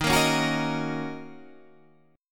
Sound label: Eb Minor 7th Eb; strummed